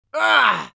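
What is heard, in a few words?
Demoman pain severe, Spanish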